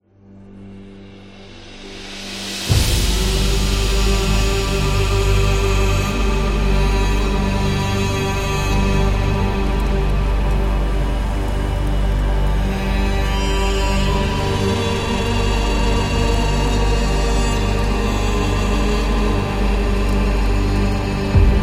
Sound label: Islam